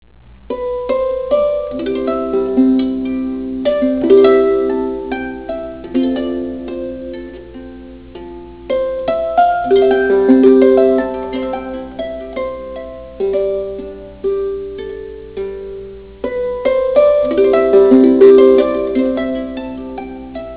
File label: 29 string